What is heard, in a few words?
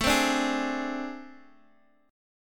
A Minor Major 7th Double Flat 5th, down, Guitar; AmM7bb5; strummed